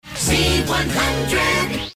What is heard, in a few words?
jingle; whtz z100